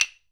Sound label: HYOSHIGI, JAPAN PERC; AKAI S6000 CD-ROM; WorldSounds